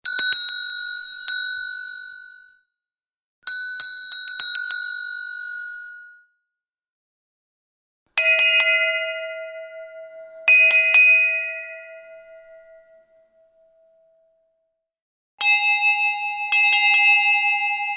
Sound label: Various rings from hand bells; Musical; Hand Bell Pack